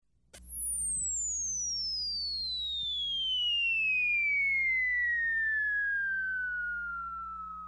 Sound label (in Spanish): bomba cayendo